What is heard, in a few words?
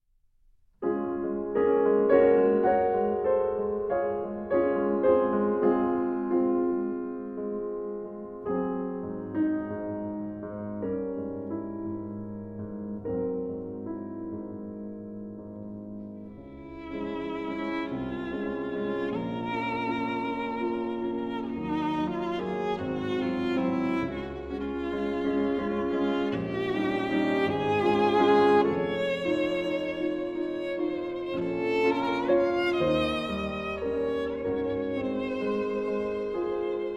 Poeme for Violin and Piano; Op. 41 No. 4 (Arr. Weniger); Zdenek Fibich